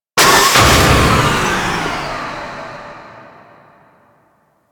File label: Shooting Challenge Tube Open; Warframe; Ambience; Grineer Asteroid